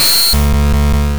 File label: Leer; Pokemon; Gen 1; attack moves; RBY